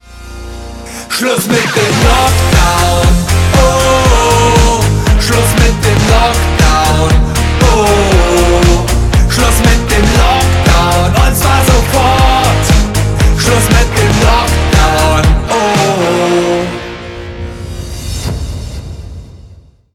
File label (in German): Klingelton; Schluss mit dem Lockdown